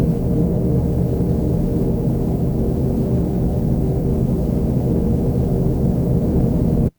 horror ambience dark

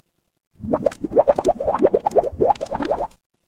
water bubble

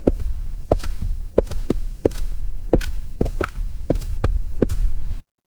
footsteps concrete

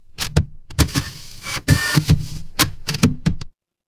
mechanical machine

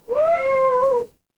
animal cat meow

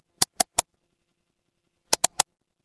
office mouse click

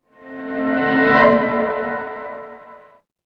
transition riser

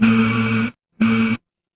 feedback error

